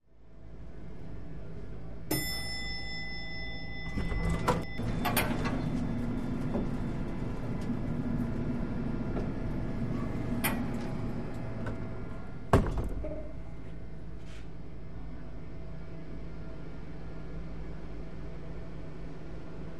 Elevator Doors Open, elevators

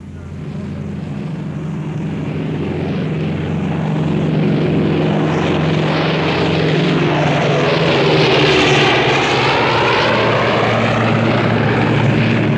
B29 By Vocals Head, superfortress

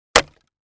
Crate Palette Type 1 Hit with Sledge Hammer

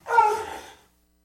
Camel Soft Moan

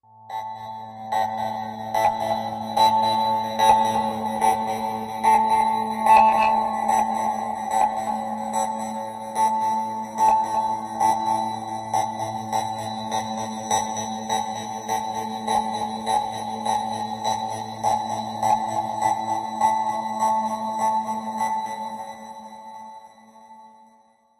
Breach Alarm Machine A

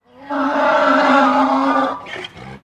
Donkey Snort Single

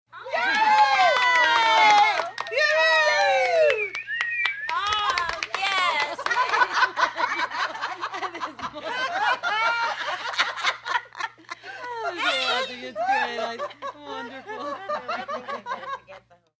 10 Women Applause

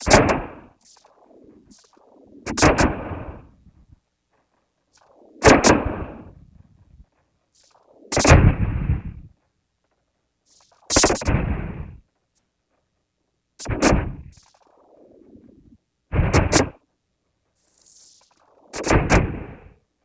Force Field Debris

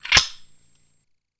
Switchblade Open, knives

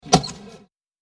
Stamp Ticket Passpor, airport

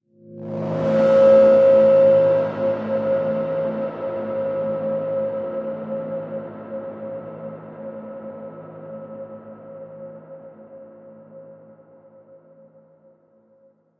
Feedback Elongated

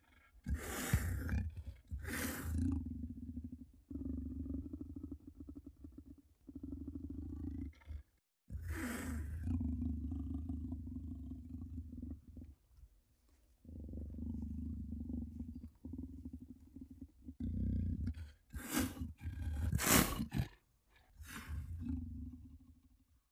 Lion Slow Growl Mediu; big cats

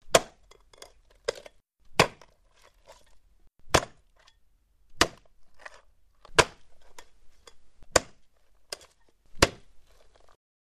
Bone Chopping; gore stabs & eat

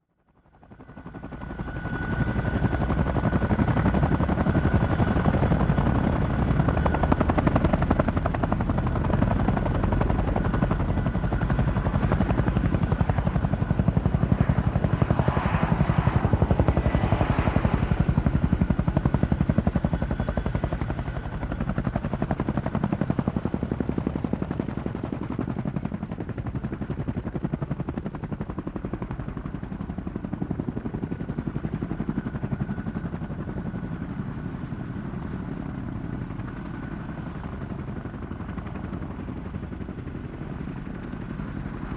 CH46 Chinook Takeoff

